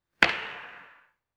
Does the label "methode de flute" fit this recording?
No